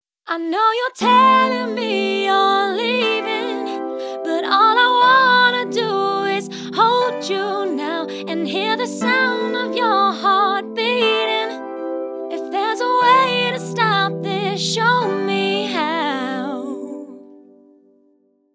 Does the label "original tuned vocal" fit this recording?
Yes